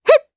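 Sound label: Jump, Japanese; Kirze; kingsraid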